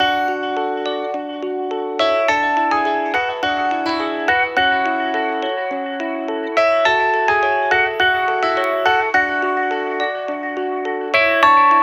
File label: ringtone